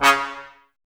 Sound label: brass, Tbs Mute; Miroslav Vitous - Woodwind Brass Ensemble